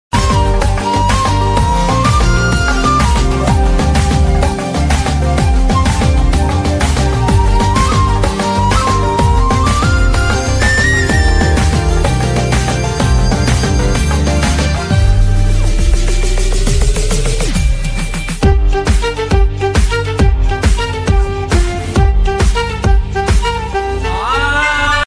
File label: movie title flute bgm ringtone telugu bgm ringtones south indian bgm ringtones